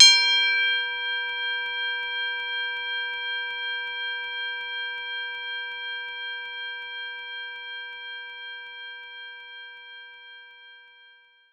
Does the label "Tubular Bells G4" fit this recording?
Yes